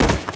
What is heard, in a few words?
bodyfall stone, foley